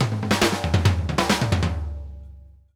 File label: Drumworks SP fills 132; Sampleheads; New York City Drumworks VOL-1